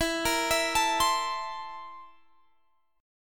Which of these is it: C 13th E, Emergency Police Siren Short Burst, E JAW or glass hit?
C 13th E